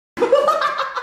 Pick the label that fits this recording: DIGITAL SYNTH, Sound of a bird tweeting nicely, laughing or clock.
laughing